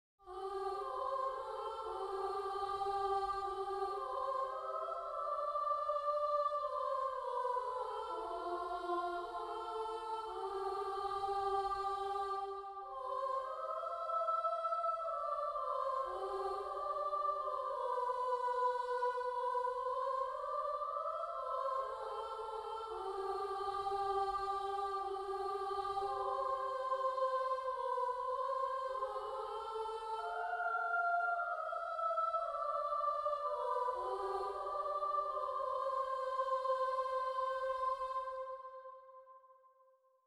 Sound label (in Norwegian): Odalssang Sopran